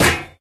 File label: physics turret gibs